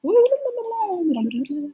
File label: message sound